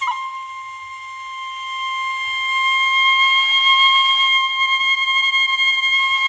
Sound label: oriental flute C; Flutes